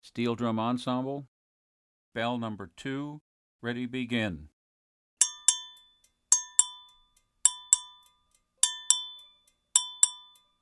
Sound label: Bell